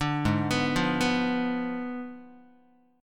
Ab Diminished 7th Ab, Guitar, 4A, 4E, 5A, 5D, 5G sharp, 6C sharp; Abdim7 arpeggiated; JGuitar